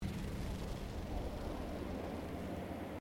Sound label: GOLF BALL ROLLING ON GREEN; Various Golf sounds; Golf (Sound Effects:Sports)